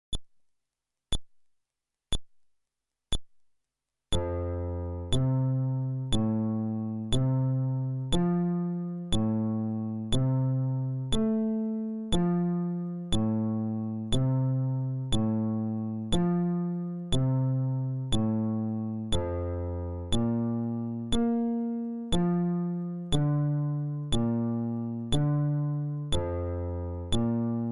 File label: Arpeggio, fretless bass